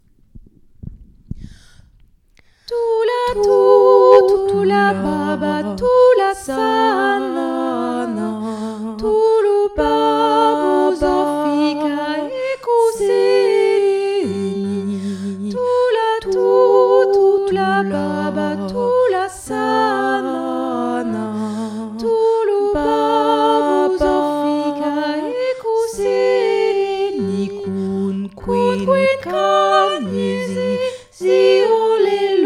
thula baba soprano basse

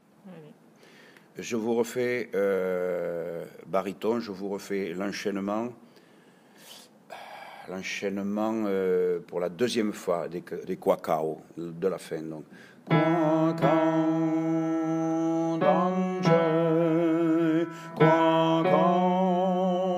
bariton suite

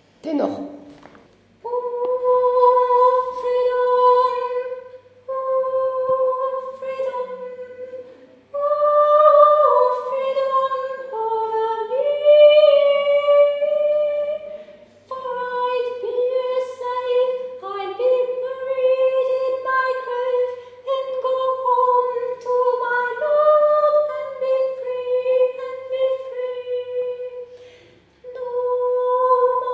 freedom tenor

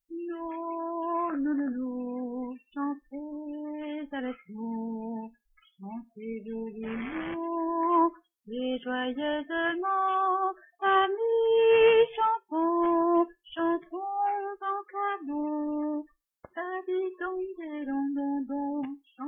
nu nu nu alto

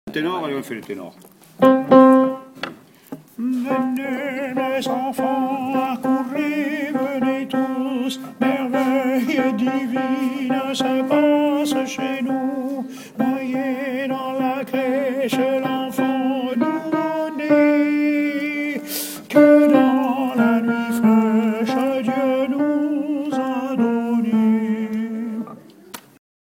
tenor

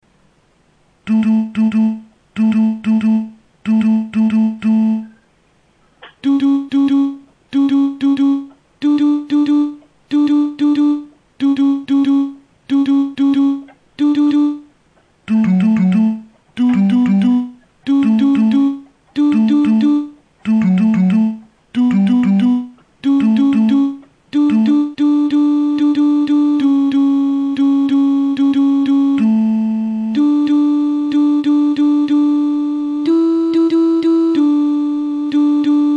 tenor; venid pastores

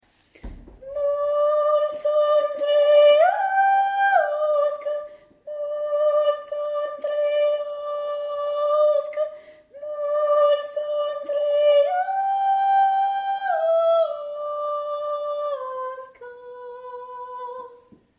tenor; multi ani traiasca

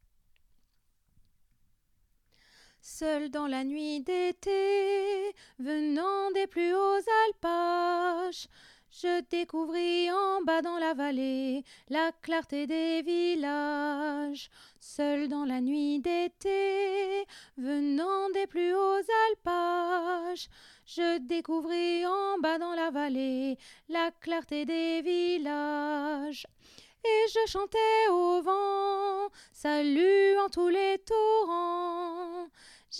soprano; la nuit des roses

